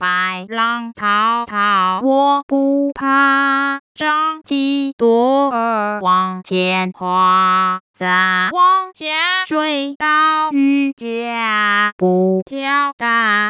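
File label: Fishing Song, Mandarin Singing Voice Synthesis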